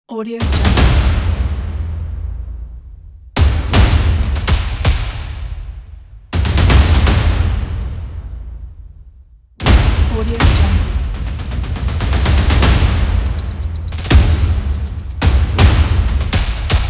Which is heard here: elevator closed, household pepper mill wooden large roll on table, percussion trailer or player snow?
percussion trailer